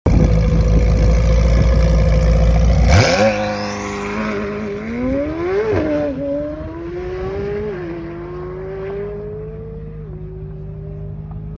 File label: McLaren